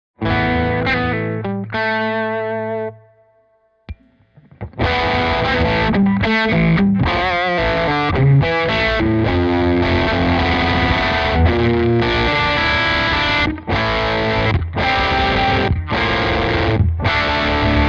With the Level control set to give only a little push to the somewhat dirty amp; Les Is / More